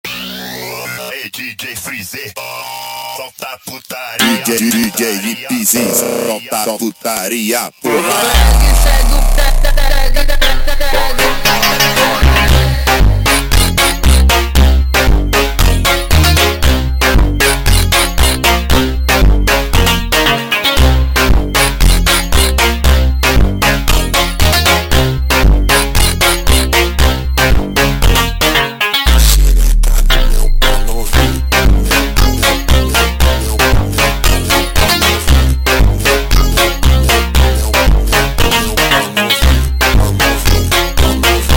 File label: slowed, funk